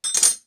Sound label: tools, wood metal; wood metal SFX